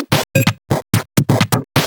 Electro Loop 128 BPM; Vengeance Samples

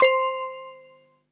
Synths C; Vengeance Samples

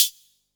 Special Hihats; Vengeance Samples; VEC1 Cymbals

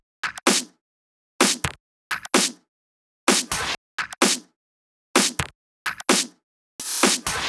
Freak Drums 2, 128BPM; Vengeance Samples; Freak Kit

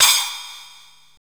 Cymbals crash; Vengeance Samples

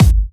Hard Kicks; Vengeance Samples

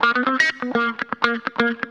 125 a#, guitar licks; house; Vocal House; PS-28 Vocal House Samples; instrumentloops

